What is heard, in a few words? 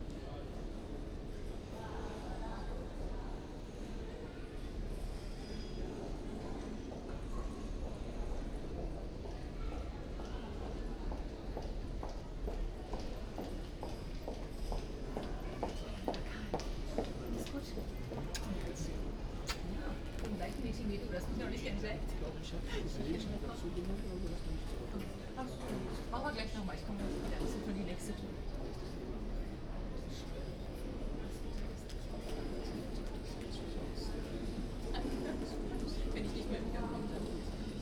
Urban Large Building After Bell Ringing INT Stereo Binaural